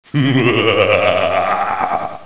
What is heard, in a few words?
Vr laugh; Virus: The Game